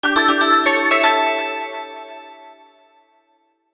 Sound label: Homestretch Jingle